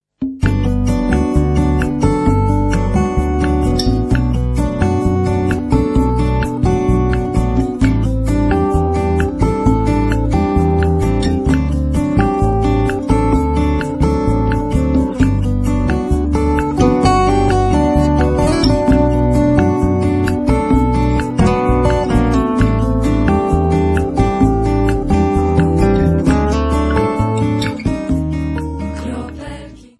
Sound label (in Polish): Jesienna bossa-nova